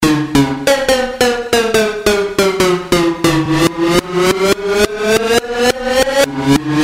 Spiral Message

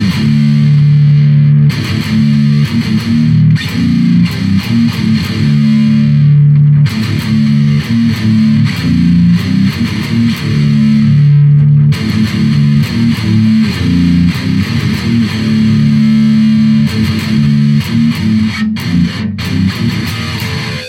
METAL

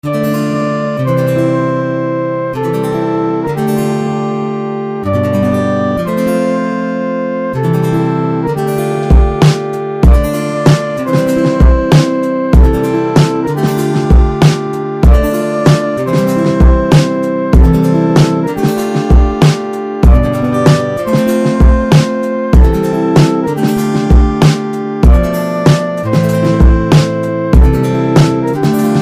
Guitar Sad

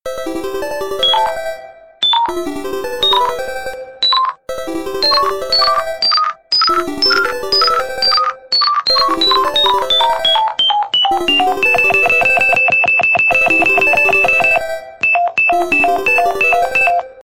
Beep Alarm; Sony New

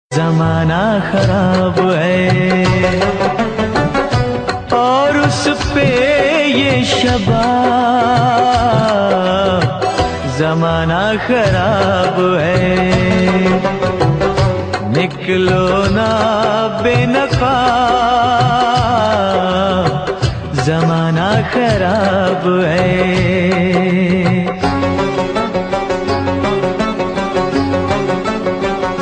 Soft India Song

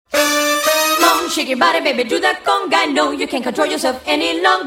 Conga

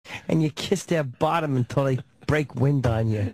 BreakWind

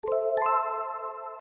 Best Chime Tone

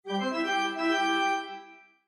organ; 365scores

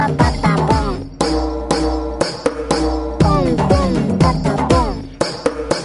Ring Tone; Patapon

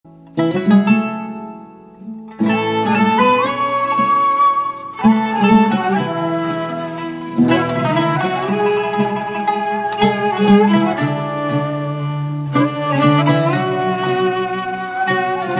Mahur Saz semaisi

